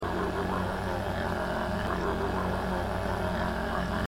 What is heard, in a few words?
engine